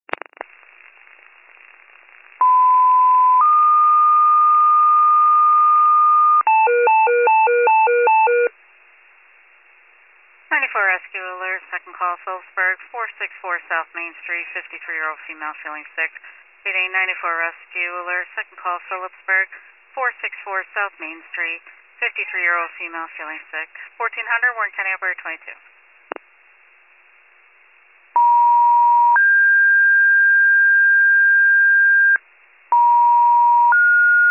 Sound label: FIRE pager alert